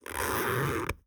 zipper medium

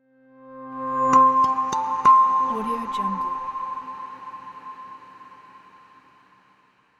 corporate intro sound; sergeyyukon

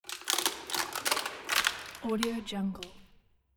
beer can crushing

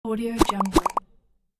appear cartoon

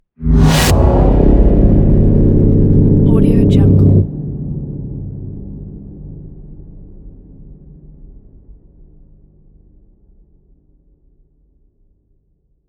space blaster impact; sinematic 2; soundmorph

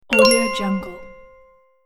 deluxe cartoon wink

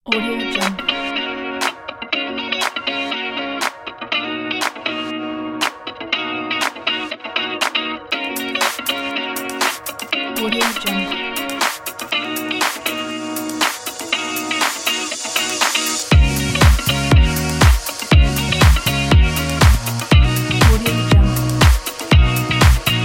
funky house pack

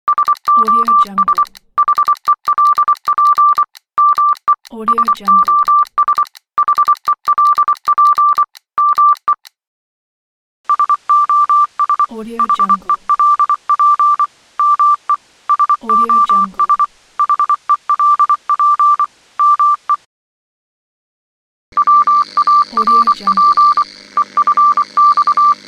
morse code

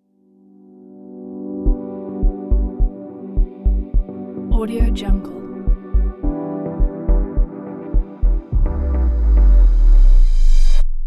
lounge logo

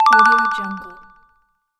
bright notification

